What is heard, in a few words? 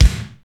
kick, ambient kick; Rhythm Section